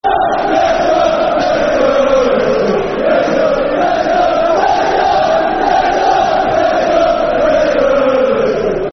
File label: Leicester, Leicester [Football Chant (Soccer Songs)]; fanchants; Leicester City Lcfc